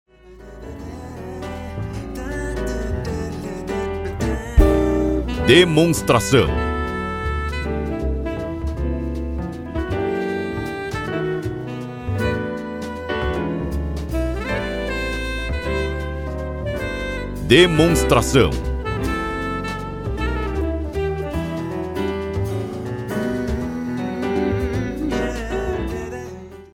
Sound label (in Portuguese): Trilha